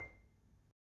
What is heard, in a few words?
marimba C5; Terkelsen